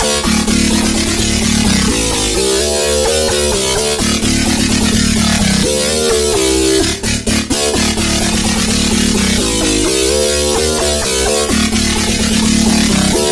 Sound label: Bass - Monster Growl, Serum 2; Routed Sound; Serum 2 Expansion - Chaos Bringer Vol.1